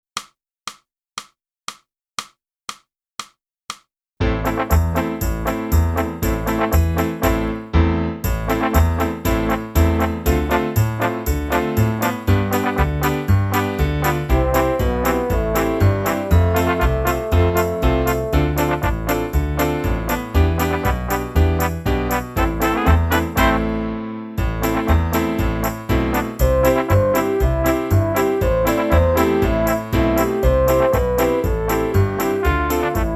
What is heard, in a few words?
flute; Boosey Woodwind Method: Flute (Book 1)